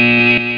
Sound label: lose turn; Strategy Companion; guess